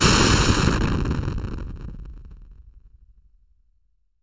THUNDER, FM SYNTH; 1000fx

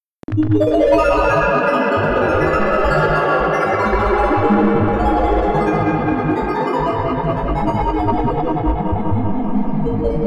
tones

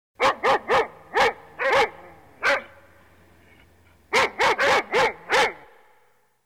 Dog Barking; SOUND EFFECTS1

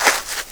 SAND, FOOTSTEPS; 1000fx

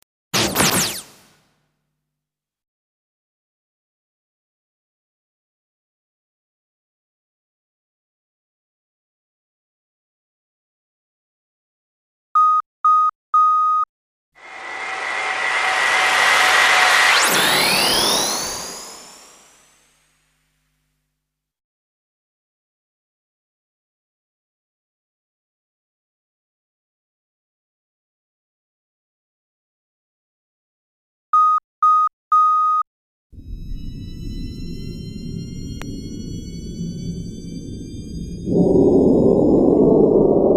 High Tech Effects, lazers

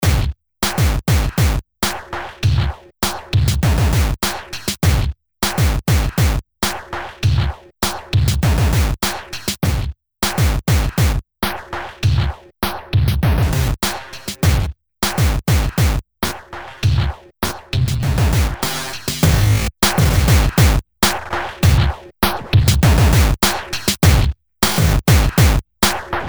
Bed - Spike Throughout; Best Of N2O Library

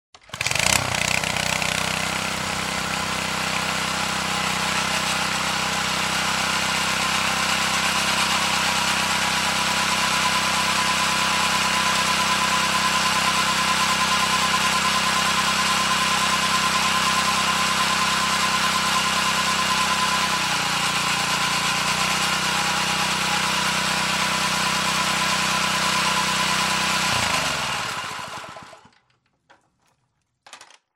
edger; SOUND EFFECTS1

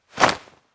Arm Release Clothing Movement; Foley - Props; Foley Essentials

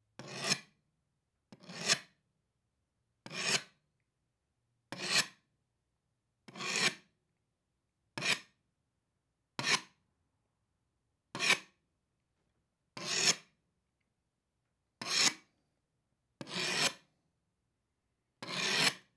Scrape Wall Long Metal Blade; Foley; Props; Foley Essentials

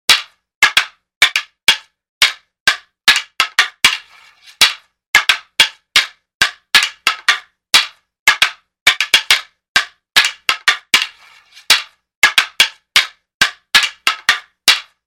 Wooden Sword Fighting Routine; Hollywood Edge Martial Arts and Human Impacts

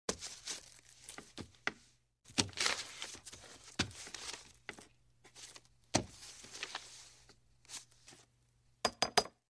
Paper Writing On Parchment; Hollywood Edge Foley Sound Library

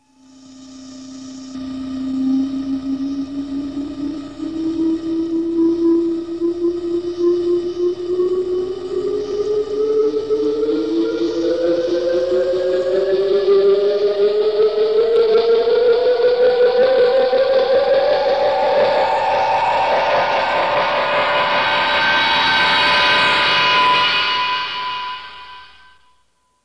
Dematerialization, Sound Effects; Star Trek Original T. V. Series Sound Effects

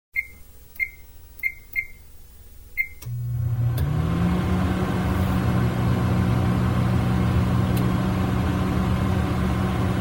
Microwave; SOUND EFFECTS1

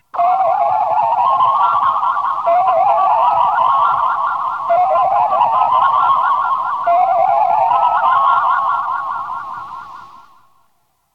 Food & Drink Synthesizer; Star Trek Original T. V. Series Sound Effects

